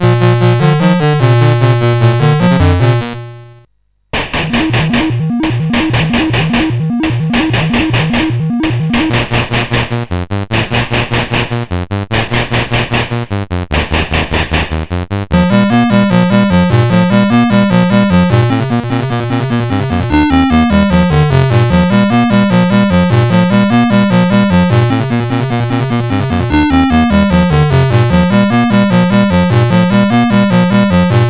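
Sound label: Puppys, MOS 8580 SID chip music, games; CSG8580R5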